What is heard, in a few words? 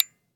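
weapon disconnector player, 1911; weapons; gmod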